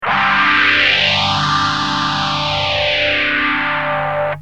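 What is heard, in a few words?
guitar DOD phasor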